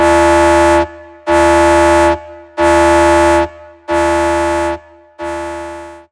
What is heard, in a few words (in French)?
Alarme Sci-Fi